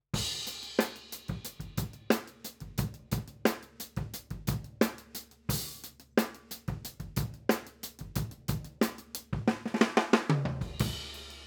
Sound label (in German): Overheads Acht; sE Electronics T2